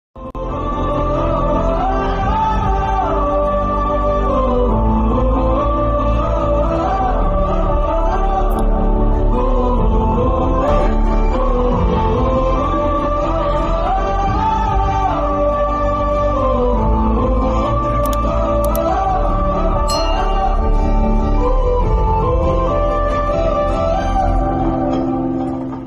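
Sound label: love background music ringtone; das ka dhamki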